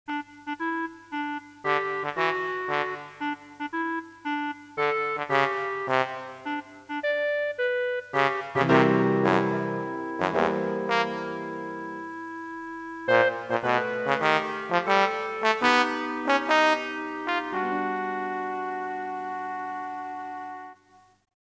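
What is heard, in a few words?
trombone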